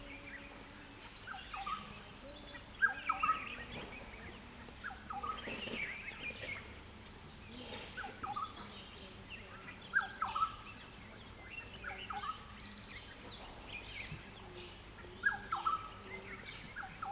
oriole tete noire, kruger; punda maria

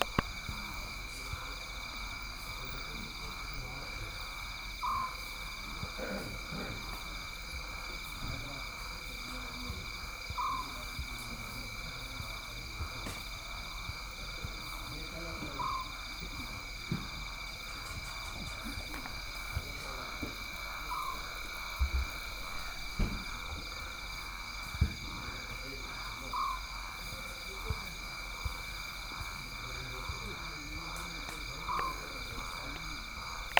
petit duc, kruger; berg eb dal

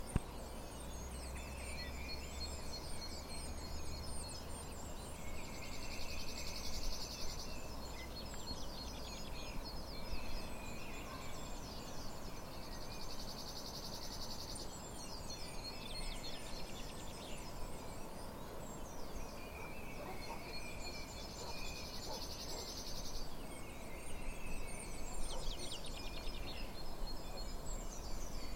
Ambiance vivario corse

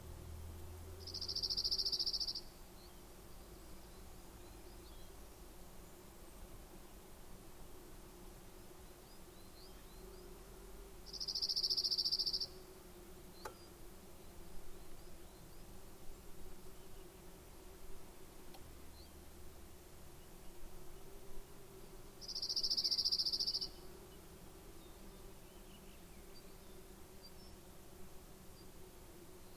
Bruant zizi; Vizzavona

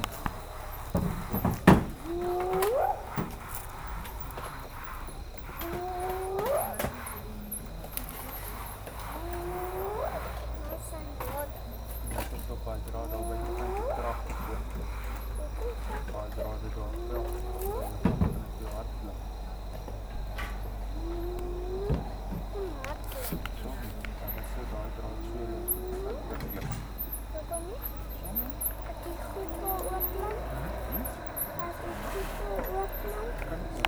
hyenes skukuza, kruger

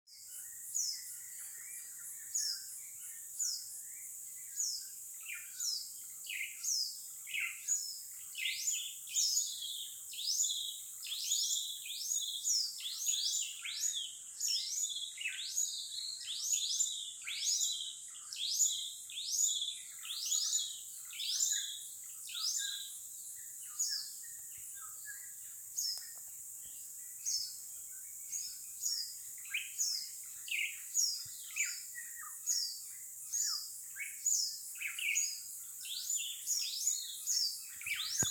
Chants

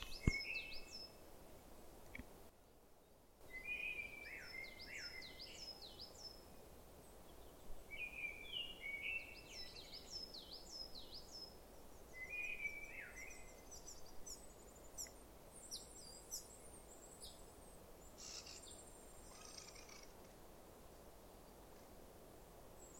Sittelle Corse mesange noire roitelet huppe grive draine; Vizzavona

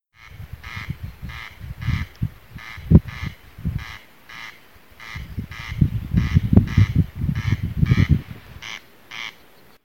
Râle des genets Bialowieza

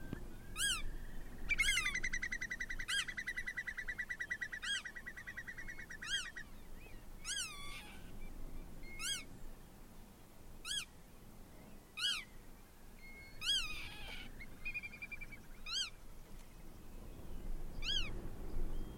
LIMLIM alarme, Islande; Dalvik

